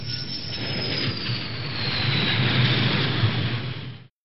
airplane

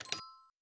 computer key hit right click

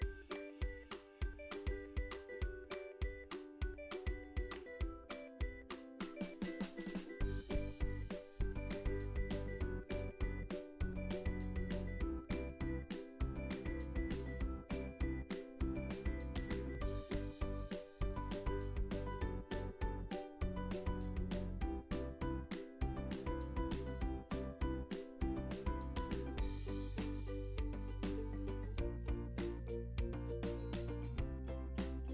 music cheerful; bgm

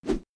throw wrong answer

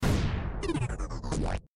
bomb explode game lose

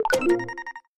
wrong answer

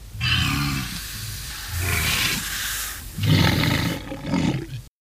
walrus

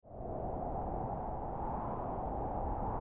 warmfront weather

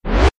pop up

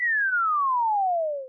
fall

